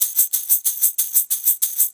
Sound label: 6-8 Metal Balls, Perc Loops